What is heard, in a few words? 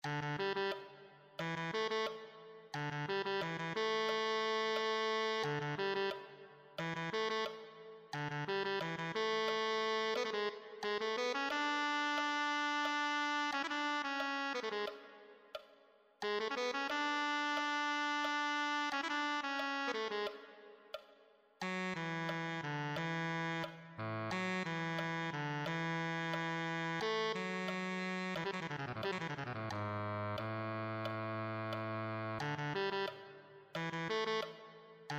tenor saxophone